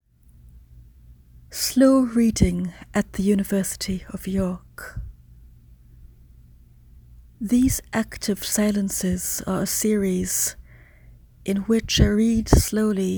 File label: Choir